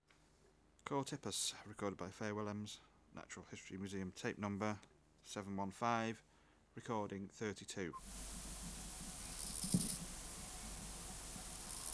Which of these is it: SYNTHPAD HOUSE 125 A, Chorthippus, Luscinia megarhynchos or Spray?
Chorthippus